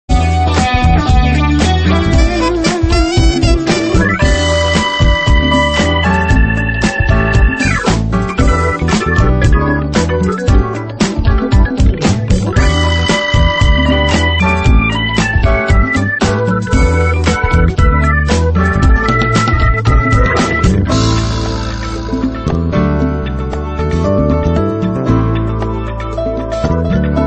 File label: Sexy and Saxy Smooth Party remix; Eliminator